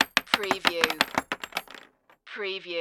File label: Bullet Shell Drop Machine Gun Multiple Solid Wood